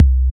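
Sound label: TR-808 BD, bass drum; Roland BK-5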